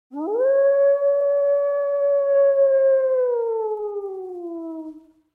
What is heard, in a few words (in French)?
loup hurlement